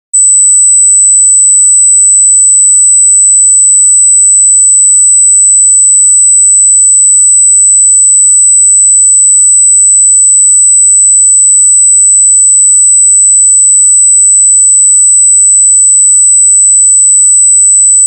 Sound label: 4 Khz; 8 Khz; Bass